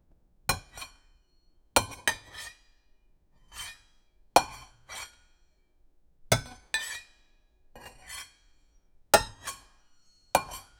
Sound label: light tapping of plates o